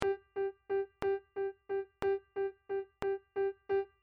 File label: Triplet 8ths